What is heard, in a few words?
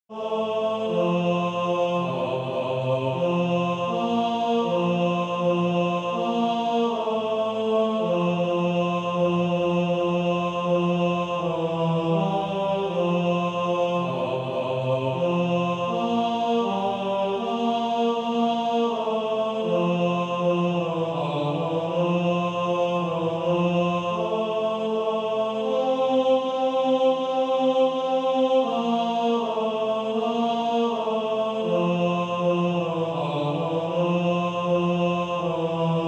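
Male Choir, tenor 1; He Leadeth Me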